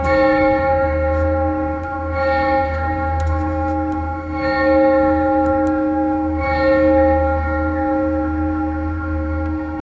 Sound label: sharp iron bell clang, hallway